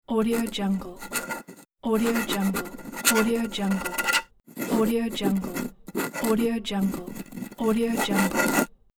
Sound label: pen